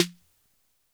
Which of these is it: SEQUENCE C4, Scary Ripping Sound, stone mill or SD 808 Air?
SD 808 Air